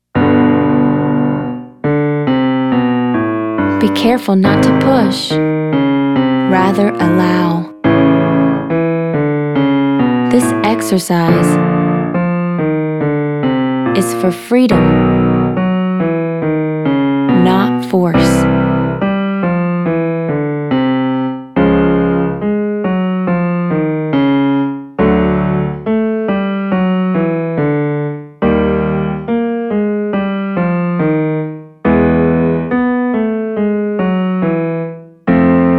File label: Exercise 5 Yeah Yeah Yeah Men; Singing